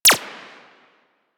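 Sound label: machine gun tech; Warframe; Archwing; Weapons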